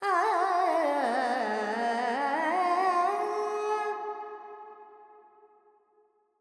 Verb Dorian, Vocals, Carnatic Female, Alaps, 70 G

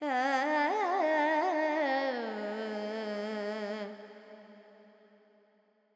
FX Purvi Kalyani, Carnatic Female Alaps; Vocals; Sample Banks

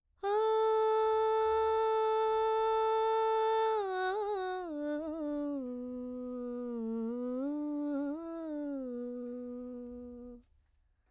female vocals dry 4-4 Bm 100

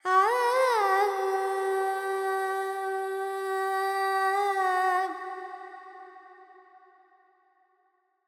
Carnatic Female Alaps, Panthuvarali, 100 G, FX; Sample Banks

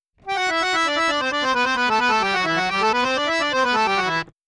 Harmonioum, Em 3-4 128, Dry; Melody; Sample Banks

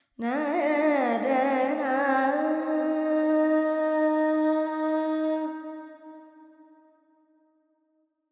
Carnatic Female Alaps, Lydian, 70 G, Verb

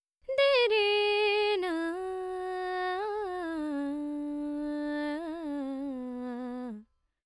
Dry 4-4 D 100, Vocals Female; Sample Banks

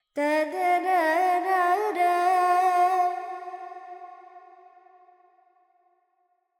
FX Dorian, Vocals, Carnatic Female, Alaps, 70 G

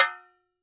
Chenda One Shots, Percussion; Sample Banks

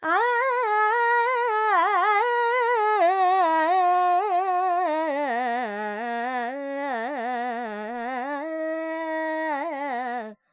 Dorian, Vocals Carnatic Female Alaps 70 G Dry; Sample Banks

